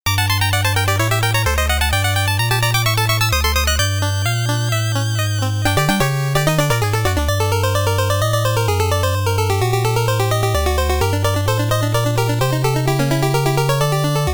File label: Clockwork (時計台ステージ); Castlevania III: Dracula's Curse